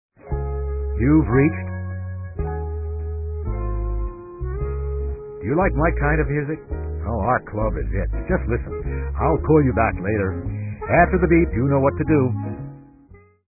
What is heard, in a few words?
Jazz Club, Answering Machine Messages